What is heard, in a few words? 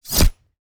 Knife Stabbing; soundscrate